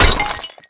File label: star break; Tony Hawk's Project 8; XBOX